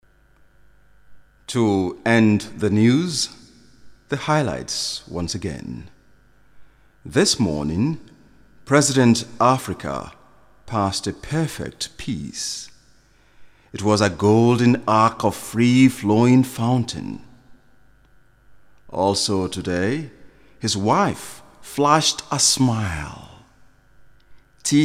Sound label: Network News Round off